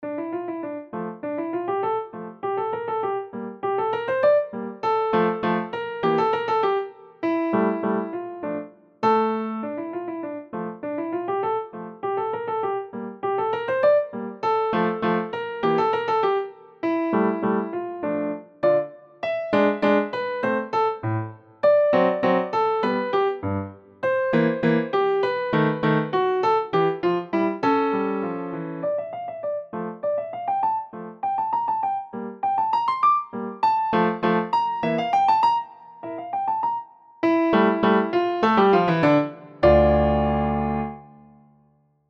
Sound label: catch the villian piano